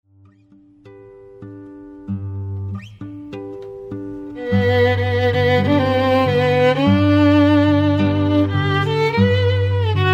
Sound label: tango